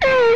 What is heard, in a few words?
Sci Fi Gun, Blast; Space Blasters2D